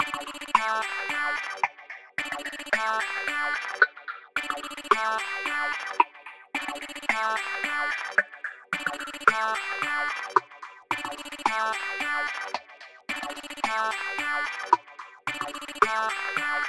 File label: Disco Ringtone